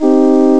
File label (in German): moll loop; ST-XX Amiga Soundtracker Sample Packs